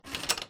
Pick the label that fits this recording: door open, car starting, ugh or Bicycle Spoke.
door open